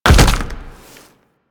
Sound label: Land Heavy Ice, Footsteps; Warframe; Tenno